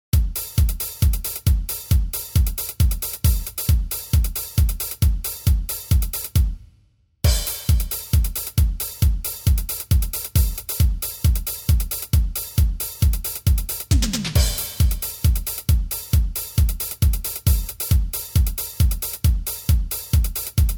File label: drum test